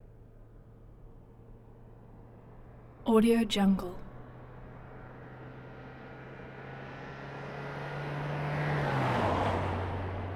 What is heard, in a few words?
volkswagen fox car 4 cylinder